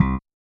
RX8 Bass, Yamaha RX-8

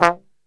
F 2, Brass Super Section, TRMB SHOT; Best Service; 90 s Sample CDs

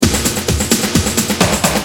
Hard, Drums Perc; Industrial

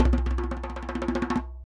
conga licks

